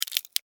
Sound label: Termite, Bite, Wood, Splinter, Crack, Insect, Bug; Blastwave; Animals:Insects